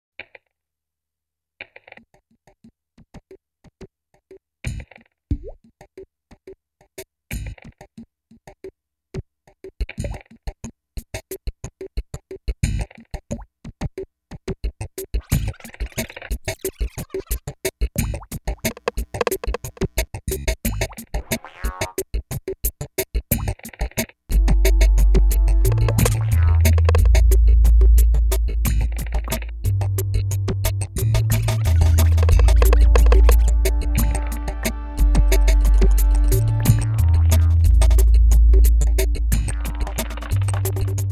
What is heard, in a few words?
Bass